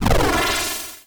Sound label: Woosh